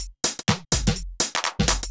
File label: HOUSE BEATS 125